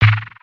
Pet In Bag

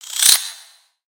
Spider Exert; Lego; creatures mechanical

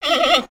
Pets Bear Panda Happy; creatures; Lego

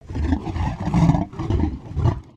Pet Dragon Idle; creatures pets

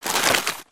paper RUSTLE; aronwk collection; ndaudio

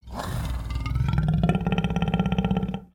Pets Lion Idle Med; creatures; Lego

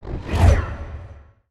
asteroid whoosh by

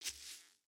Foot Grass Walk, footsteps

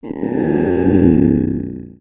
Stun; Skullkin; creatures organic

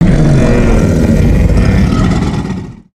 Dragon Idle; creatures organic

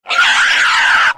Pet Warthog Growl; creatures pets

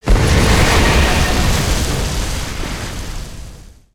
Siege Tower Impact; destructibles

